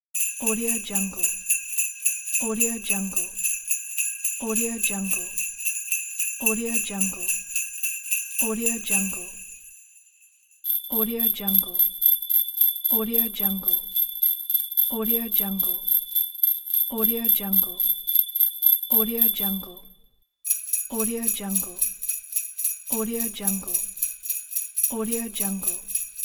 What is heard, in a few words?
christmas jingle bells jingling